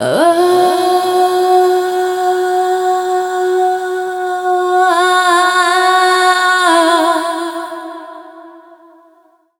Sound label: FEMALE, Dance Vocals